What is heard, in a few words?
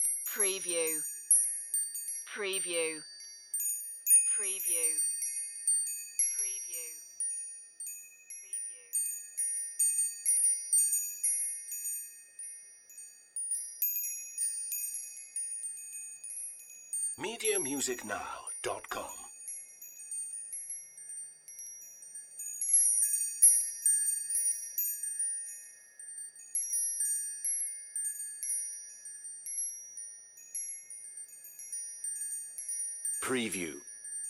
Wind Chimes Metal; Sound Effects Bible